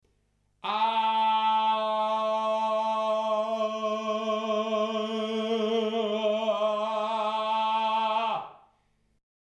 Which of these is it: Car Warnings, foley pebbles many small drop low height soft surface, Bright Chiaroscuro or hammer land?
Bright Chiaroscuro